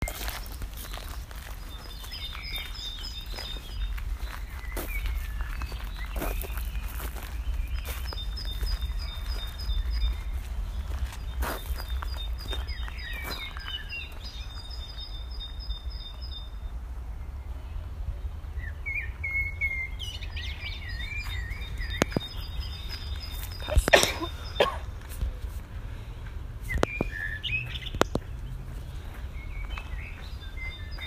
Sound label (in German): atmo; iphone6s